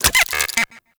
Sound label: Lure Move; Warframe; Teralyst; Vomvalyst Lure